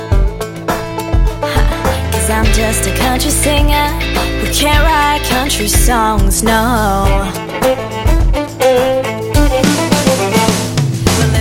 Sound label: Country Singer